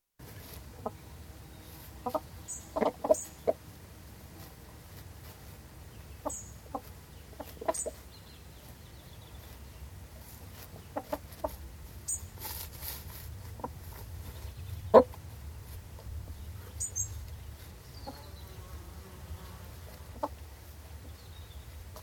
Chickens, exterior, cockerel & hens scratching in grass for corn, with some very gentle wind in trees & aircraft briefly heard in distance; Close perspective recording; Chicken Sound Effects; BBC